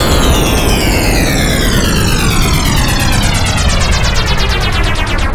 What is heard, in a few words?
FX SWEEP